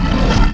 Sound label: die; ROCKSTRIKER